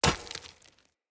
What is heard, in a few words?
Short Sword Medium Impact Rock; Weapon Impacts; Player; Swords; Environment